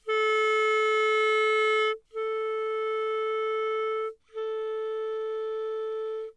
Eb reference

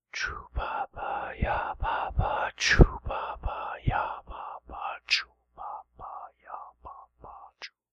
choo pa pa two pa pa

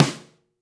snare

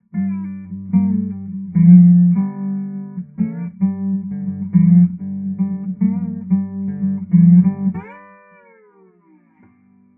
dobro guitar mic

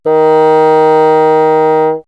Bassoon ff Eb3; dsp2008 sound library

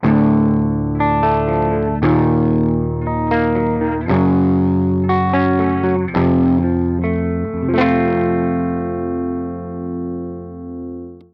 ENGL SM57 loud, cabinet

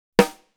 snare

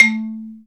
marimba

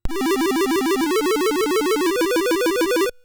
c64 chord

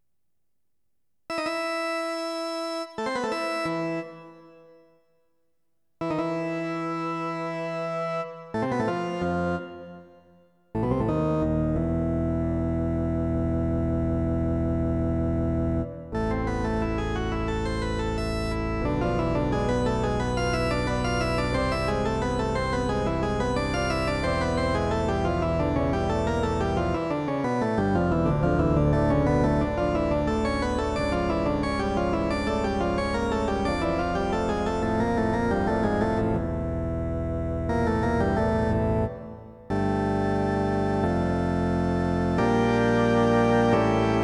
bach Org

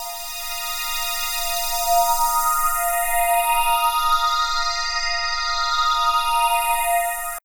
Deep Pad

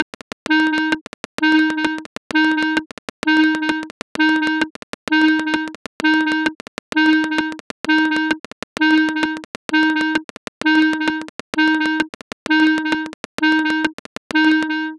samba

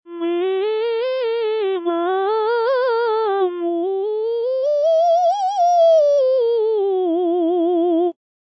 vocaliz

